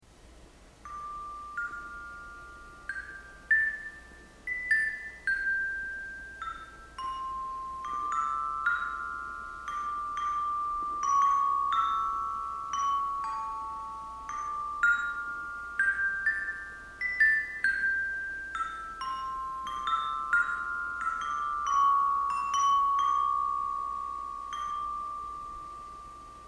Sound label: bells